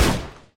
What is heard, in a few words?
shotgun; RS Games Sounds